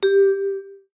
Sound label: voip record start; tweb-i2p